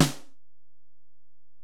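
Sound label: Acoustic Snare, Alesis DM-PRO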